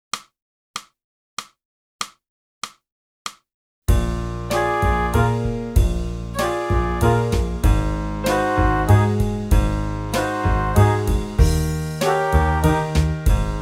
sax

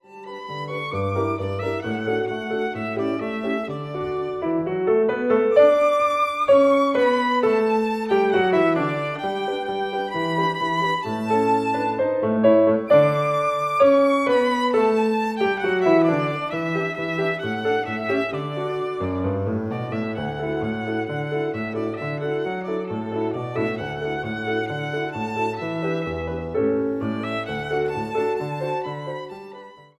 VIOLIN

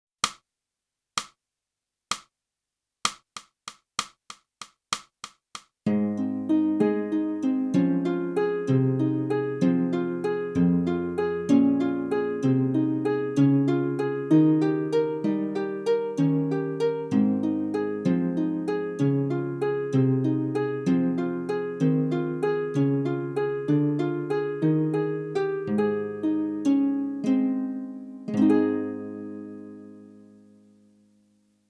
clarinet

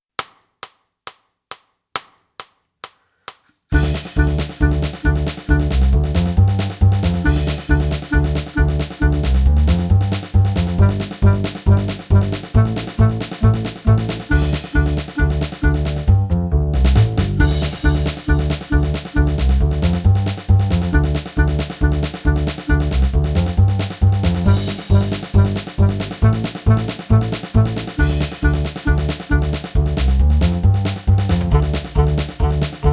clarinet off the rails; Boosey Woodwind Method: Clarinet (Book 2)

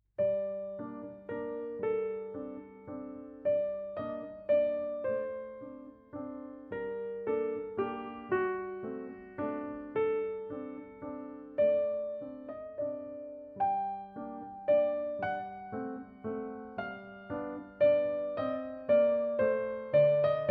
piano

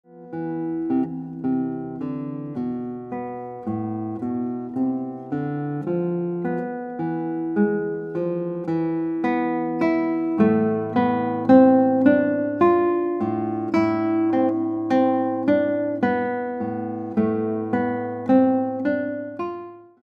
Guitar

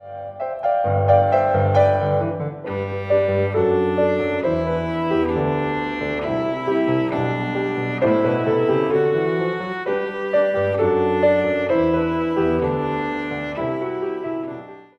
VIOLIN

